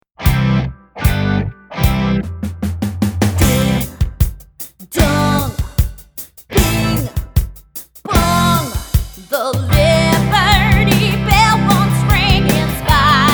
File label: vocals